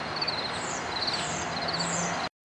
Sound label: Wood Thrush Sound